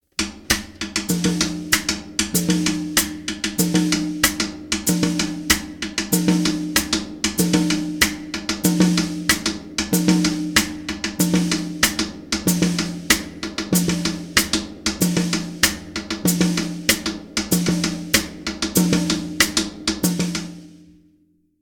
Cascara Groove; Cascara-Groove 1; Schwarzes Album; Bum Bum Tschack Jazz Latin

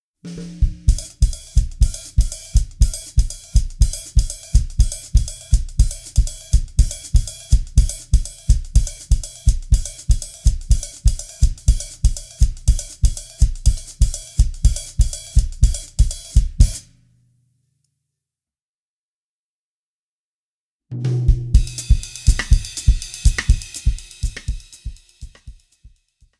Baiao-Groove; Baiao-Groove 1; Schwarzes Album; Bum Bum Tschack Jazz Latin

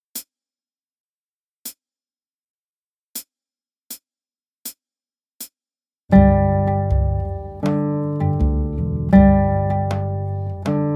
Bim Bam Trad. Österreich, Guitar; Play Guitar Junior mit Schildi

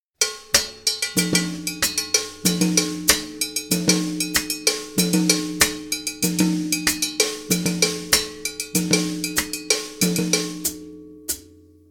Mambobell-Groove, Latin; Mambobell-Groove 2; Schwarzes Album; Bum Bum Tschack Jazz Latin

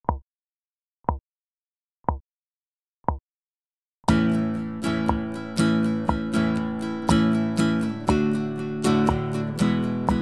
Strumming Nr. 12, Acoustic Pop Guitar

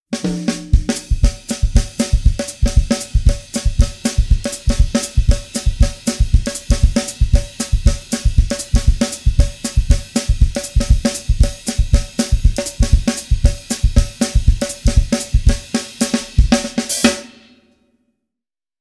Samba-Baukasten, Latin; Samba-Baukasten 1; Schwarzes Album; Bum Bum Tschack Jazz Latin

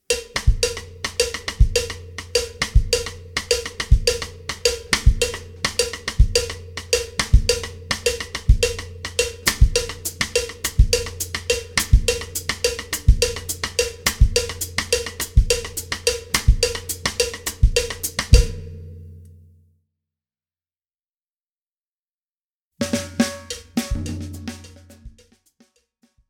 Songo-Groove, Latin; Songo-Groove 1; Schwarzes Album; Bum Bum Tschack Jazz Latin

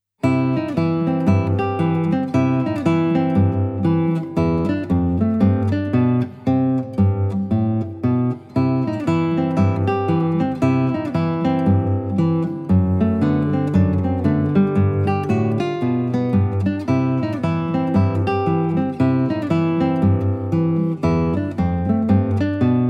Fishing Blues, Guitar

